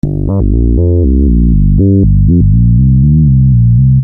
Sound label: Syn Bass 120 Gm, Techno Synth Loops; SynBs; 120bpm; Sonic Foundry (Sony Creative Software); Signals I